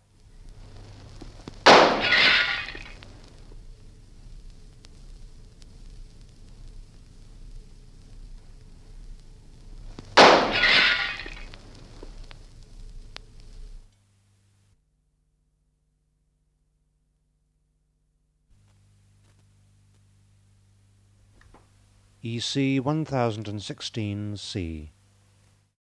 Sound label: Single, Single pistol shot - fired through glass; from 78 disc; BBC